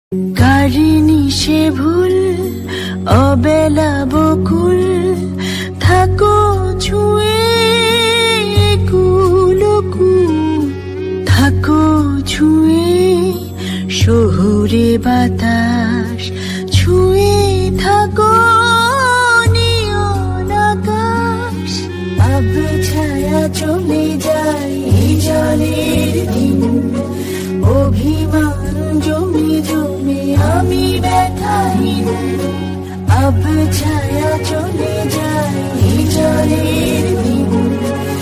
Bengali Song Ringtone

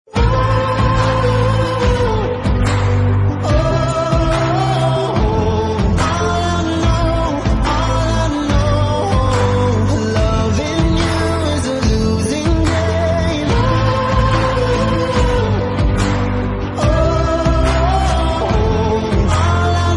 Arcade; Ringtone

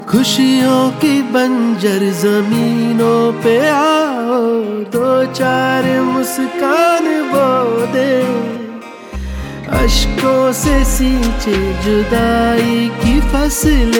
Sad Ringtone

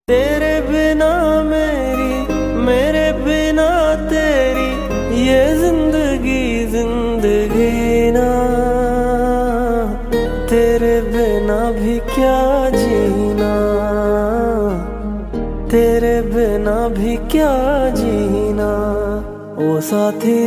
Sad Ringtone

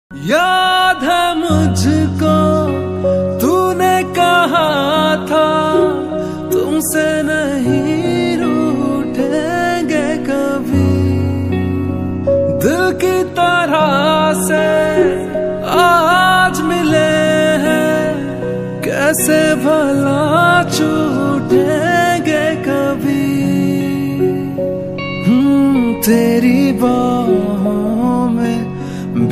Sad Hindi Ringtone

